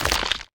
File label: skeleton big hit; wesnoth